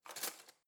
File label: cutlery spoon drawer grab hard